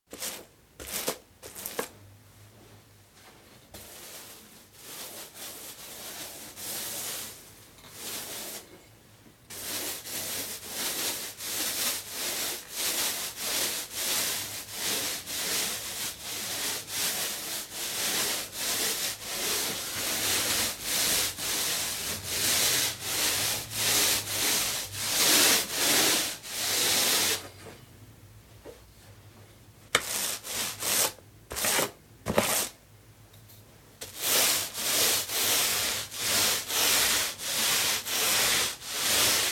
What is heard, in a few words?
Painting, roller; Machinery; Machines; BBC